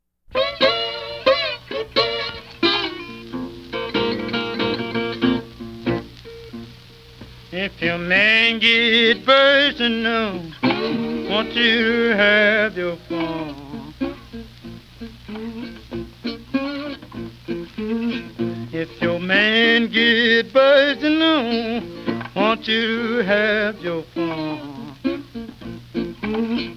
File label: Blues